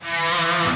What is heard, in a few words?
Electric Guitar Air Slam Down; heartslash